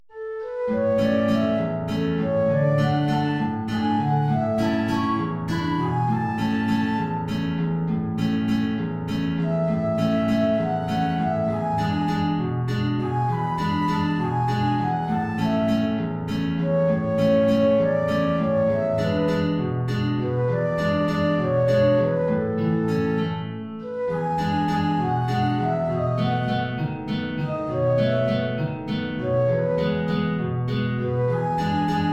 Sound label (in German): Querflöte Gitarre A-Dur